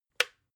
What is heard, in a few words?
water boiler switch off